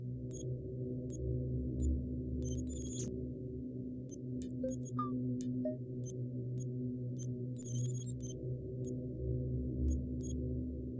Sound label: atmosphere station beeps; stars end